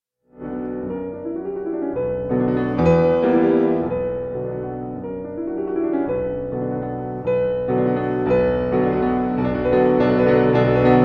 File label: impromptus d 899 op 90 for piano no 2 in e flat major; 4 impromptus